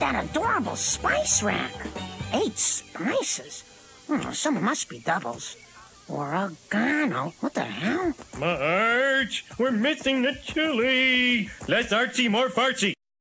fart; Simp Park

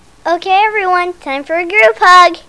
Group Hug

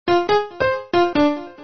piano

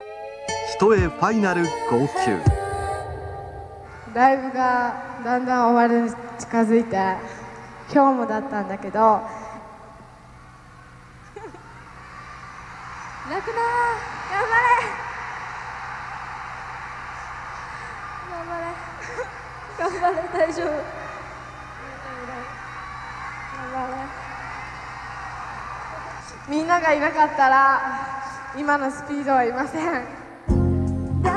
speed cry

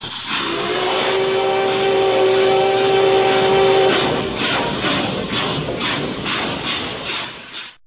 train

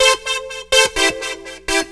Happy Comp

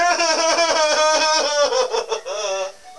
laugh

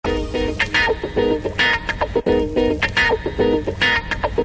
melody

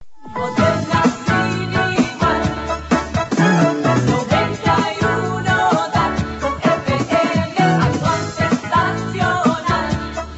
jingle

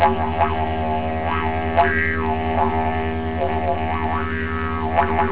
didge

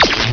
zap

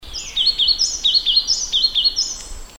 Yellow Tit; Macholophus holsti; song; Bird Call Recordings

